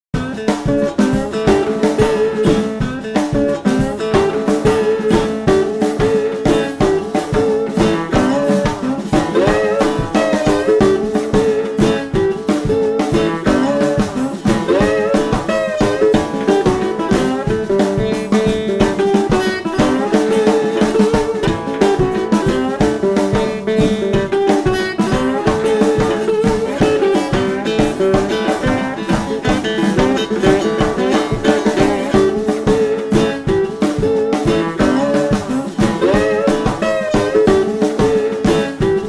Resonator Guitar Snare Downhome 90

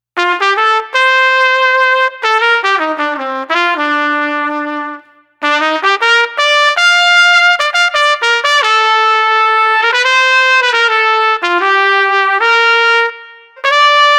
Street Trumpet Lofty 80

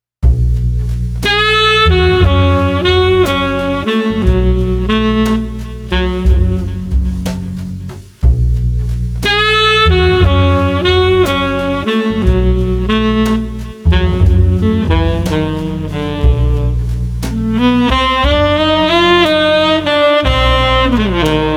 Sax Bass Drums Intrigue 120

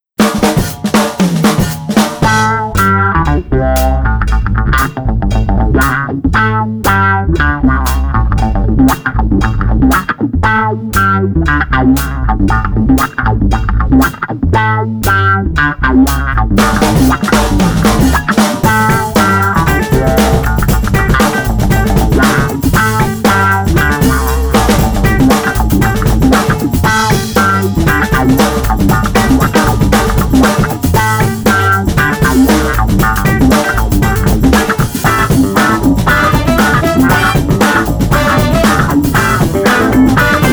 FunkRock Blues Club no Slide

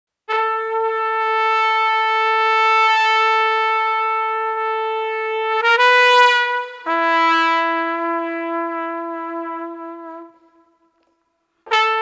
Street Trumpet Sustained

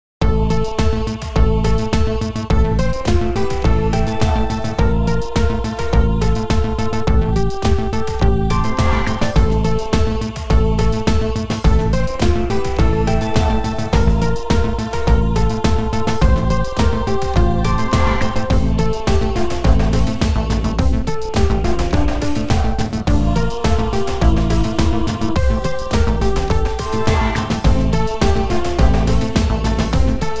Jingle Bells

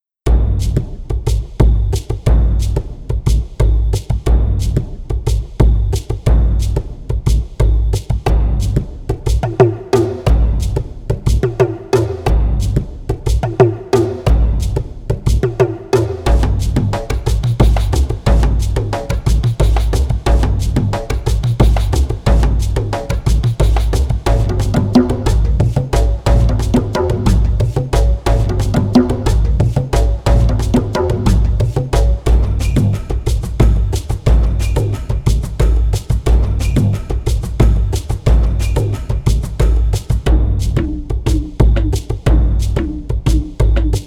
Hawaiian Percussion 120

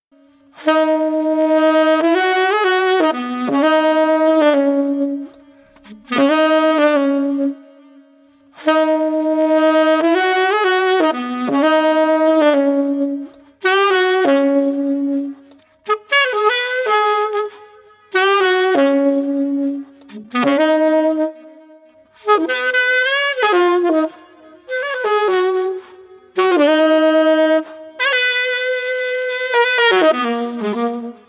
Sax Smooth 120

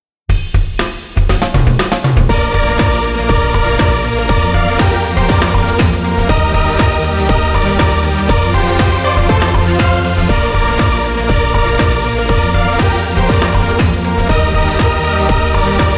Jingle Bells

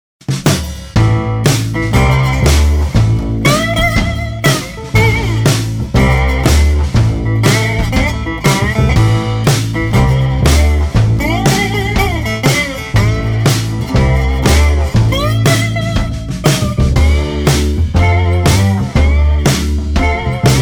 Blues Rock Slide Party 120

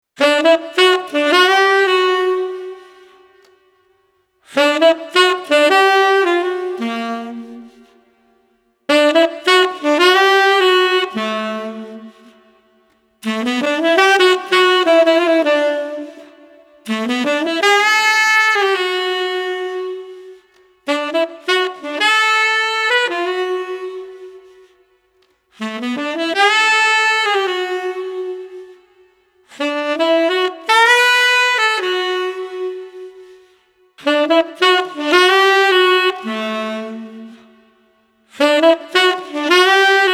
Street Sax Soulful Melody 110

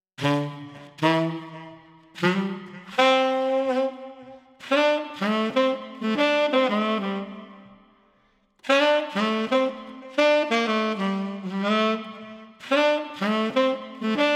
Street Sax Lively 120

